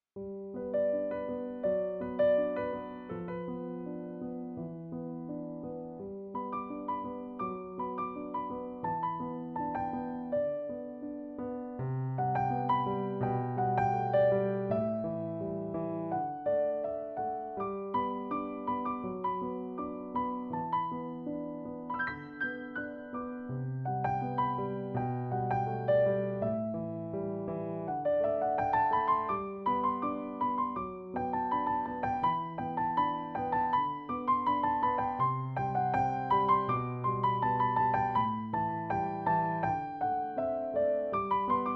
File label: piano; ströck feierabend; „griffig & glatt“ – Kunden-Magazin Radiospots